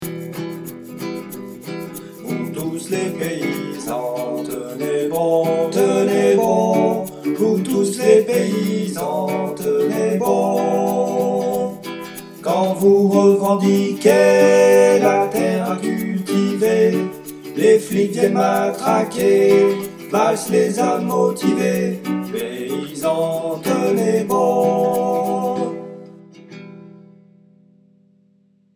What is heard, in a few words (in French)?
Trois voix